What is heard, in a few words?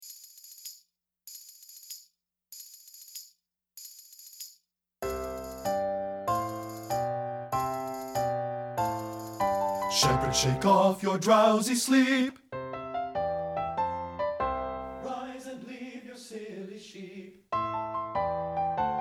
BASS; men